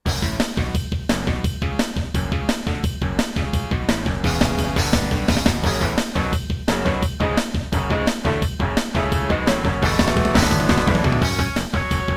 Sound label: Intro, Procomp Pro-Multimedia; Duke Nukem 3D